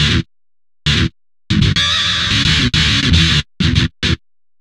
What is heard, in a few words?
GTR, Chemical Beats